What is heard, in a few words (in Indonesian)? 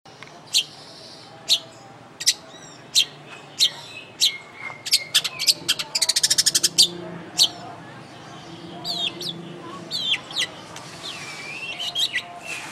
suara burung cendet atau pentet dengan ragam variasi lagu dan gaya